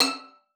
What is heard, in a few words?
E6, VIOLIN SPICC; Xsample VOL-5